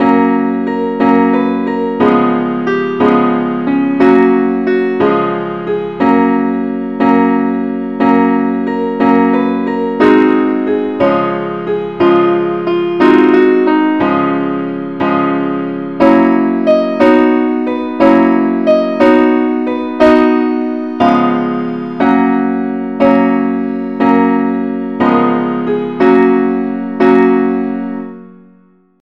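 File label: simple acc key F sharp; angelvoices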